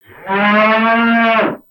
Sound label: Pet Buffalo Speak; creatures pets; Lego